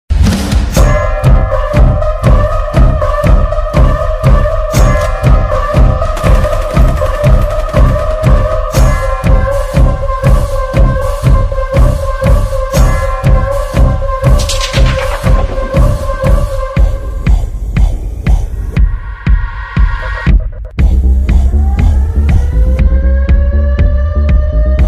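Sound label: mass entry background music ringtone; animal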